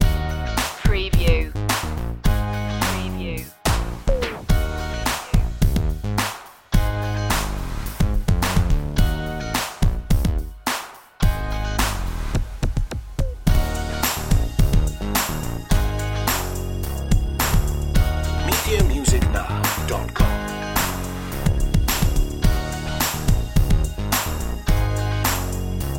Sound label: super funky groove underscore